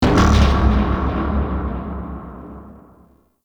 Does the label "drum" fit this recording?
No